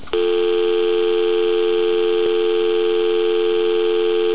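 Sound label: dial tone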